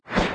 sword swing; Smite; Cabrakan

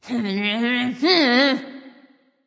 grumble; smite; nemesis

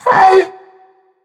sigh; smite; neith

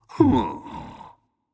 sigh, voicepack; smite; jorm

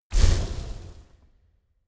projectile; smite; god serqet

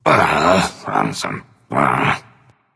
grumble; smite; heimdallr

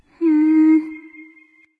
thinking; discordia

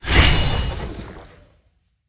fire; smite; hera

